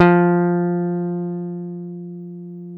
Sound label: F 4 JAZZ GTR; Univers Sons; Jazzistic